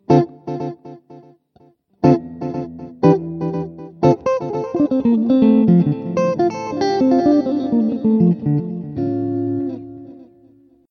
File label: Delay Sample